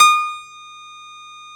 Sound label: Piano Db4